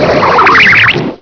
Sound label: WHIRLING UP